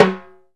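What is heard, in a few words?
low timbale center; E-MU Producer Series Vol. 2 – More Studio Essentials; Composer; Timbales Xswitch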